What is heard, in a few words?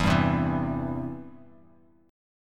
E Minor Sharp 5th E down; Em#5 strummed; JGuitar